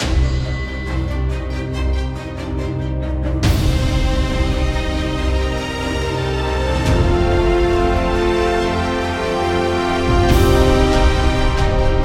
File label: Music Maingame Intense; divine megaways